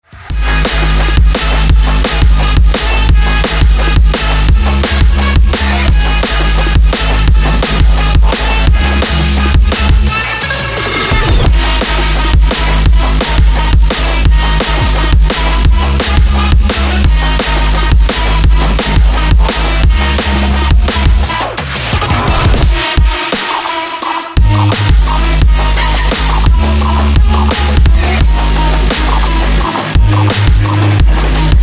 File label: techno; slam